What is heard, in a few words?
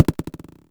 LoFi lo bounce; Loafa; Ableton Foundation FreePacks; Wicked Kits